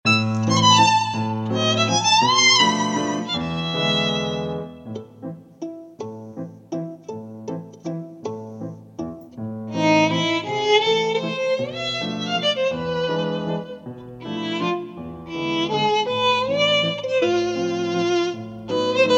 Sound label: violin